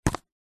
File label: household plastic cup empty grab pick up; zapsplat